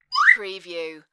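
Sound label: Slide Whistle Rise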